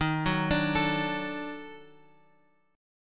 Eb Minor Major 7th Flat 5th Eb down Guitar Open G

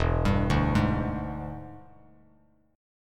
E 7th Sharp 9th E down Bass Standard; E7#9 arpeggiated; JGuitar

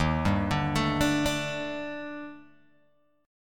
Eb Major 7th Flat 5th Eb Guitar Open G

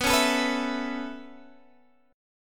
B 7th Suspended 2nd Suspended 4th, chord

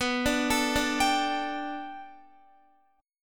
B Minor 7th Sharp 5th B Guitar, chord; arpeggiated; JGuitar

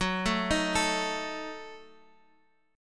Gb Minor 6th; Gbm6; arpeggiated; x,9,7,8,10,x

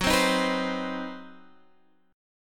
C Minor Major 7th Flat 5th Gb, chord

